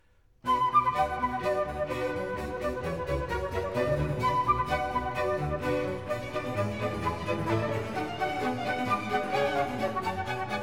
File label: orchestral suite in B minor badinerie